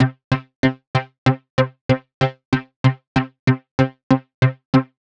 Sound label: Modu Knocka 95, horrorscape samples 95bpm